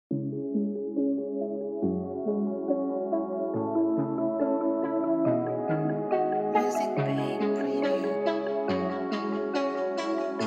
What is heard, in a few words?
Nostalgic Modern Urban Trap Beat